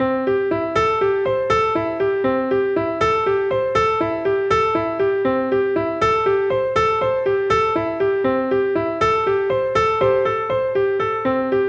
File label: Exercise for 4th Finger